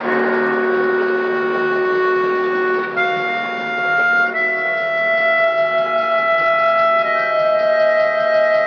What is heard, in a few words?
keys, Sad Loops